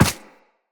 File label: Run Ice, Footsteps; Characters; Operator